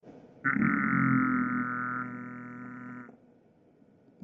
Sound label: ghrmmmmmm